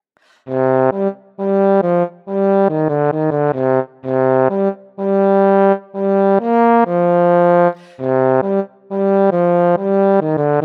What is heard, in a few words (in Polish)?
Sakshorn